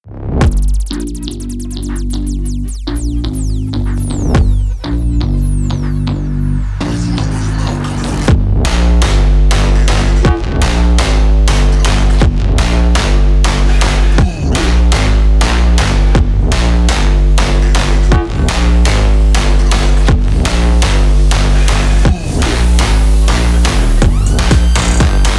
brazilian phonk

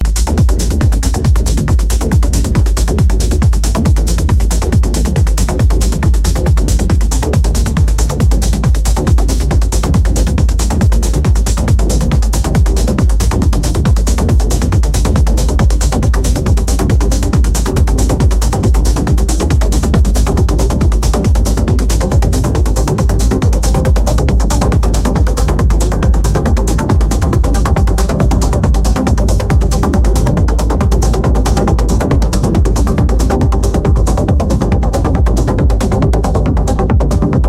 electric heartbeat

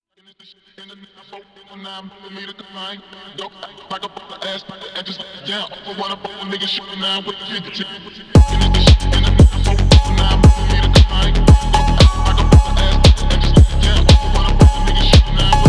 phonk

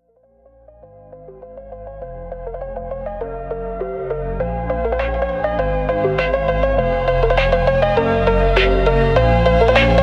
night phonk speed up

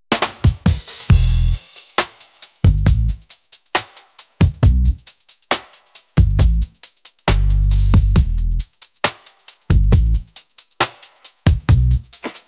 in the garage bass

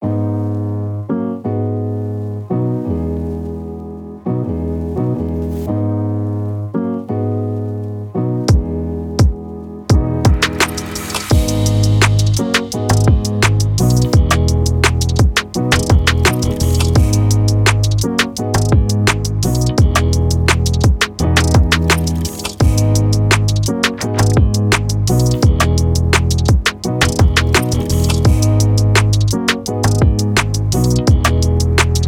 lo fi hip hop

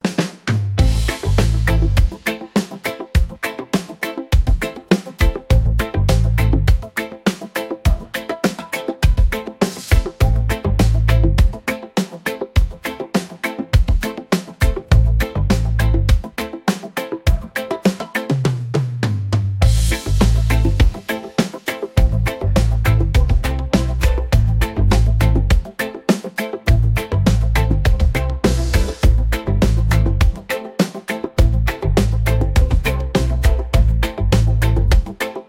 reggae

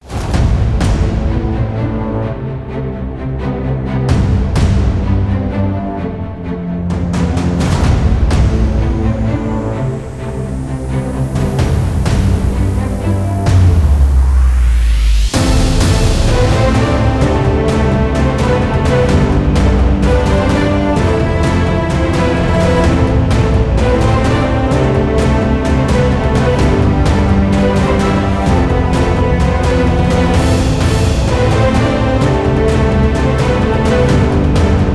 epic heroic dubstep trailer

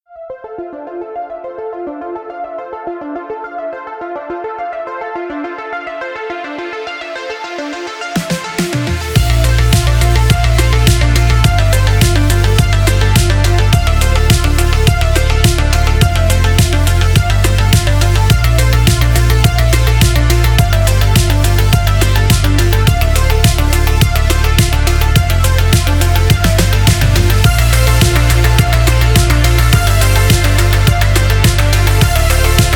synthwave

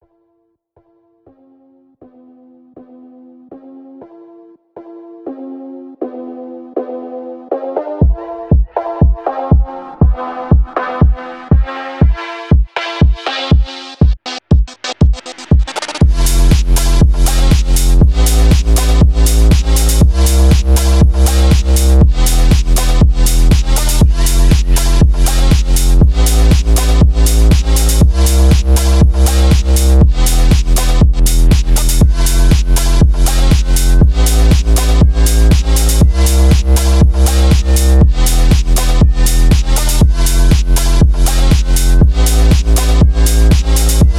phonk soundtrack

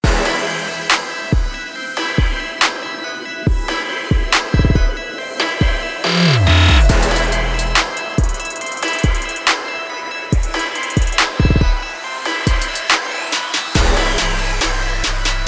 dubstep trap

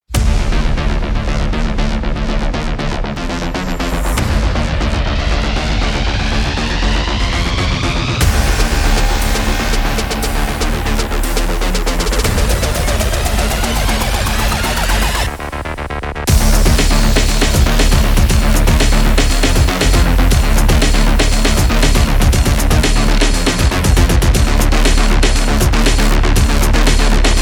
acid breakbeat

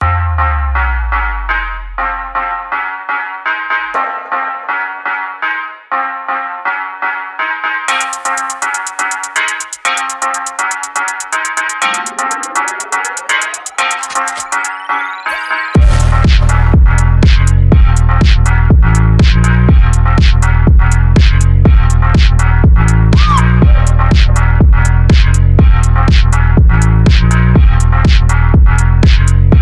phonk energy

